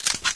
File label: deagle clip out, weapons; Source Engine; cstrike